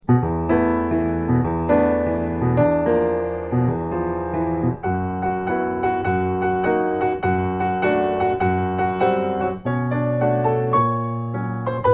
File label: solo piano